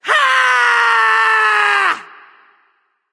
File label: battlecry; smite; izanami